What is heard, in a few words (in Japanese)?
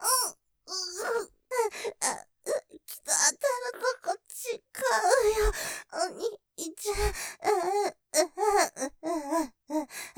喘ぎ; きたぁ；当たるところ違うよ；お兄ちゃん◆ドロドロに; ステレオ